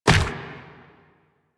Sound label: Proto Infested Runner Step Default